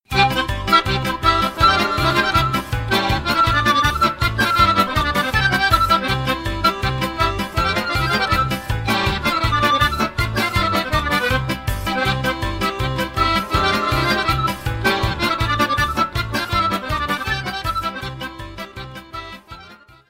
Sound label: The Shepard dance, Roumanie; The bell